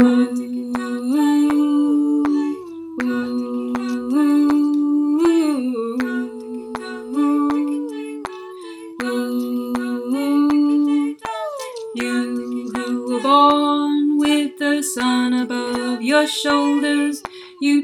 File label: greek song alto